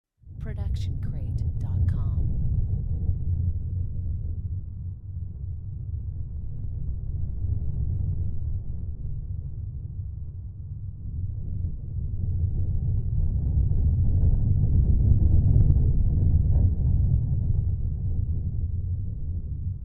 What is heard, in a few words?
Scary Rumble; soundscrate